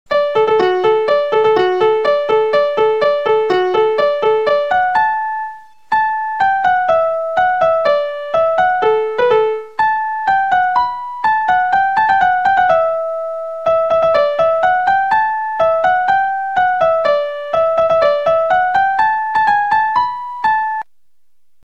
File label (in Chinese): 音乐铃声; 钟声; 广播室铃声系统